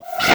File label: Flash Whoosh; Multimedia Internet CD-Rom Flash